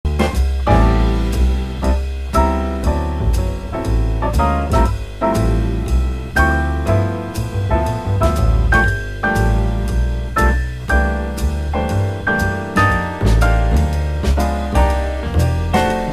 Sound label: Rhythm Changes in Bb bridge